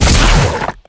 Tongue Grab Attack; Evolve; Behemoth

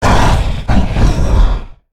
Charge Attack Vox; Evolve; Meteor Goliath

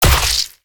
Teleporter Blood Splat; Evolve; Wraith

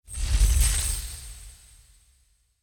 Ability Gooberball Fire; Lurker; Evolve; Gorgon

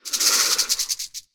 Acid Barrage Rattle; Lurker; Evolve; Gorgon

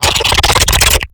Stealth Attack Start; Lurker; Evolve; Gorgon

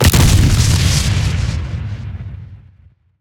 Banshee Missile Impact; Evolve; Elder Kraken

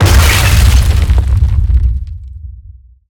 Mortar Cannon Impact; Evolve; Behemoth

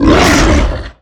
Stealth Attk Grapple; Evolve; Behemoth

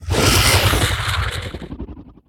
Teleporter Round End Victory; Evolve; Wraith

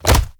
Collisions Bodyfall Soft; Lurker; Evolve; Gorgon

